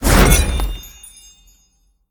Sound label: projectile; smite; olorun